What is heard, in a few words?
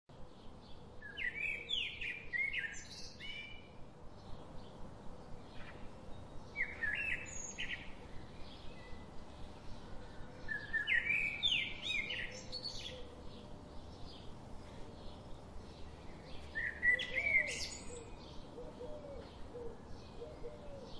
birds chirping calm